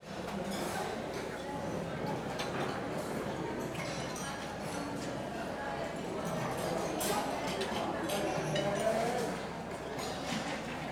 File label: busy dining room, European Ambiances; 3maze